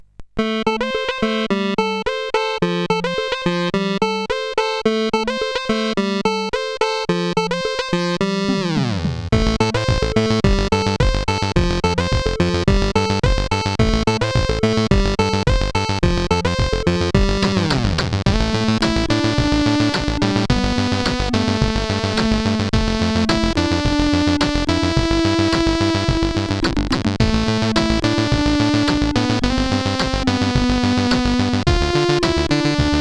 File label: CSG 8580R5; All Rockets Flew Away